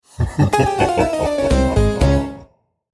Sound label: Halloween playful transition cartoon; festivities